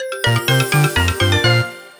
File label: win; Amatic; Chinese Spider